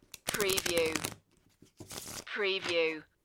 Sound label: Card Shuffle